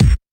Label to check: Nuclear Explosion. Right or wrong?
Wrong